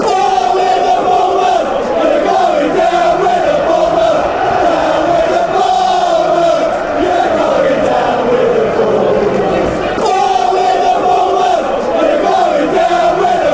going down bournemouth, fanchants

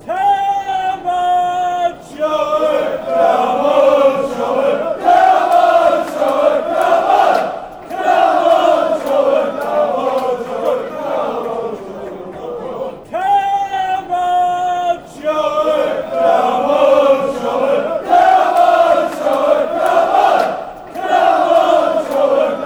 come charlton come charlton, fanchants

